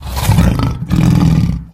giant breath; russian; sven; russian sounds

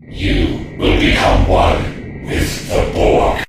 alert; borg cube

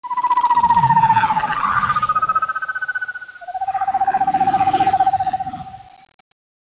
floater howl

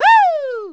melee attack; sven; player; toad

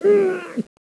pain, player helmet; sven; bts rc

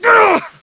pl pain, player; bts rc

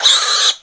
pain; sven; russian sounds; tushkano

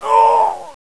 die, player; sven; bts rc; construction

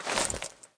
deploy, weapons; sven; hydra

